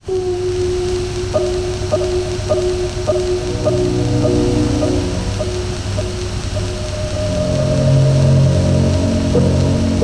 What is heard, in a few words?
mind noise